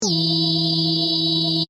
Vintage Ray Gun, Scifi, Vintage, Ray, Gun, Science, Fiction; Battle Sound Effects; SoundEffects-BlastWave